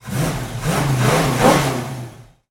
Mustang 68 Fastback Rev Multiple Engine Perspective